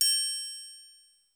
Open Tr, Drums, Roland JV 1080

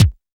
SDS5 kick, Simmons; Simmons sds5 kits

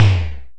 Snare Hi Simmons2, Modular; Wiard 300 Series

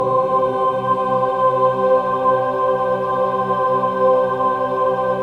min chord, Symphony of Voices

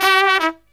TRUMP, Killer Brass Riffs

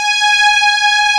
Textural Strings

